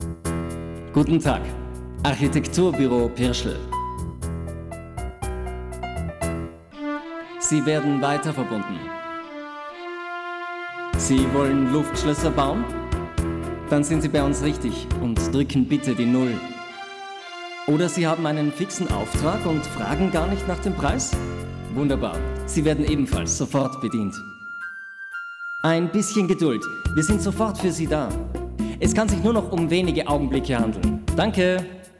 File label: audio jingle; pirschl